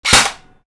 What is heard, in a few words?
Airsoft Shot (Mono); SFX; IRL Recorded